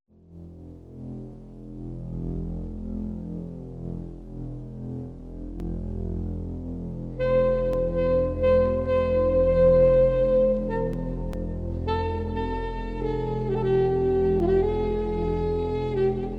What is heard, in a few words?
Sax Lullaby